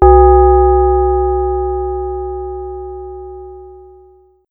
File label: Bells